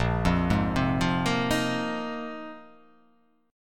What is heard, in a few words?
Bb 7th Suspended 2nd Suspended 4th down, chord; Bb7sus2sus4; arpeggiated; JGuitar